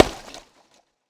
hit, Processed Foley; Sound Design Tools

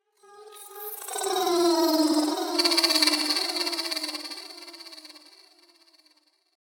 Vox; The Weird Side Samples; 99Sounds

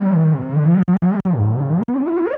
Feed chorused, Feedback FX; 99Sounds; Dark Engine

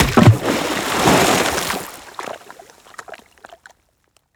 water splash; Bluezone

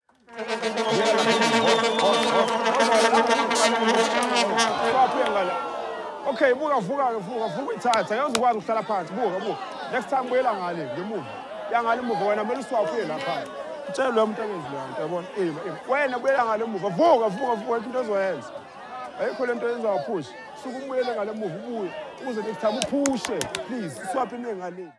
South African Soccer Crowds; TheWorkRoom Audio Post

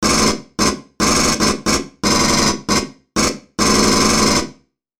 M41A Pulse Rifle; FXHome; Bullet and Gun

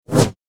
Double Bladed Saber Sound; FXHome

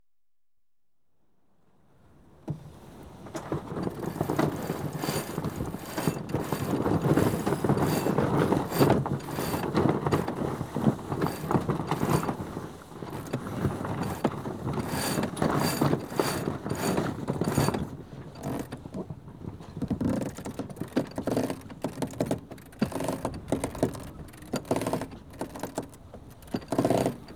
30ft Yacht, Going Abo, Ships And Boats; Alice MAY; BBC Sound Effects Library; Ships And Boats 2

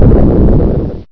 rumble; Kenney's Sound Pack; Retro Sounds 1

